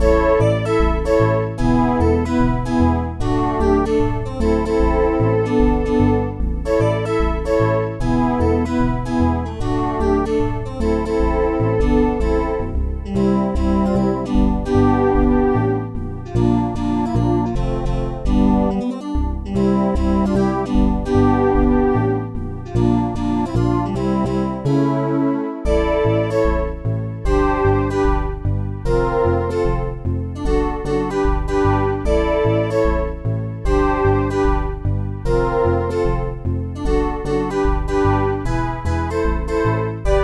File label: Cute Tune; ft2